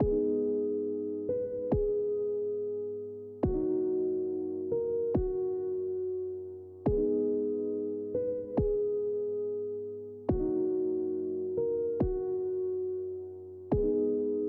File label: lo-fi music; ローファイミュージック